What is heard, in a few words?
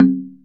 Tongue Lo; Multisamples; Digital Sound Factory Planet Earth